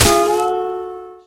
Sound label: Summer Flower Destroyed; fowl; Summer Blocks